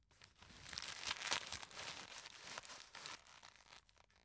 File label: tissue stuff in package